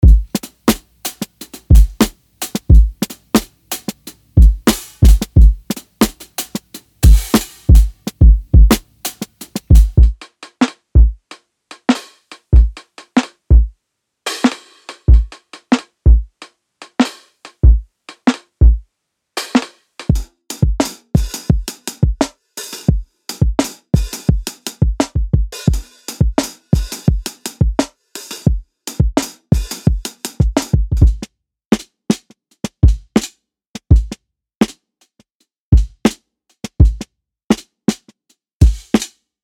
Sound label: Eternity Drumbreaks; vintage soul and rnb; ric beats